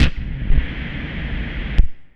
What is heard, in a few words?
impulse, signals; AKAI; FX SIGNALS